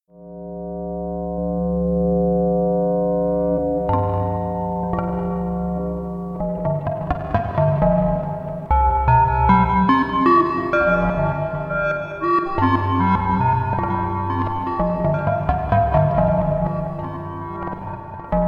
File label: ring modulator